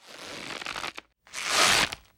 lizard stretch leathery stretching inflating

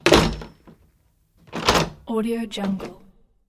modern house back door shut and locked

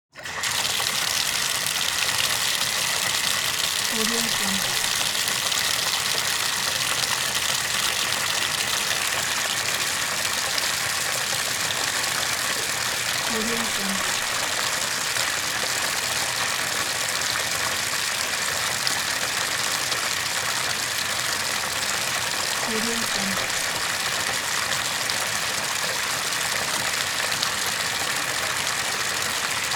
bathtub faucet

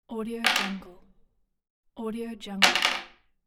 drop batteries

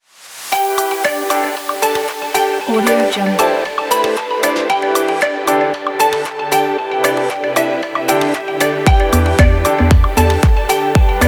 positive summer day

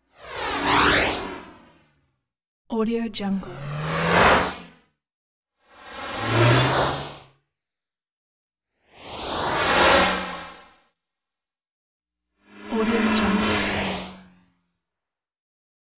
magic swoosh fast zooming pass bys

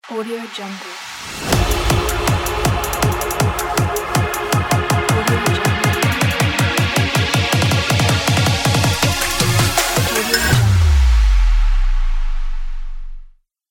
edm intro logo

